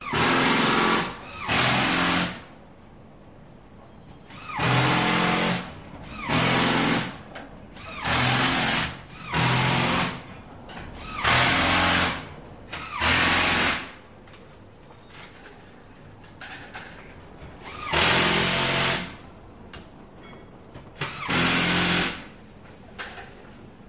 electrical screwdriver working with short breaks indoor ambience; Sound Ex Machina